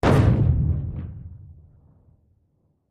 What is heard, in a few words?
30 Feet Primacord